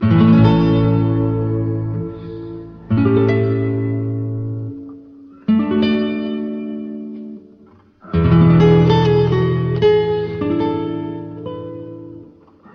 guitar mariachi, Loops

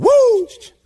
"woo", voice

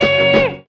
eee

